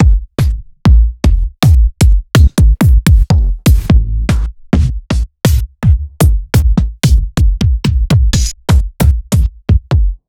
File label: Bassdrums; Sliced